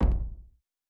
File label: UI Click; Fantasy Interface; Fantasy Sounds Bundle